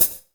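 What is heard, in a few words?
hi-hat, drums; Best Service; Real Mega Drums VOL-1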